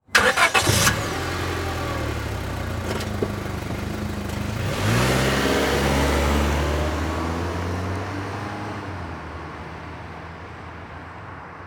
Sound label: Cars Honda Civic Start Drive Off Slow; transportation